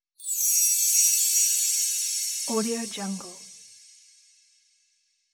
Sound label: magic christmas chimes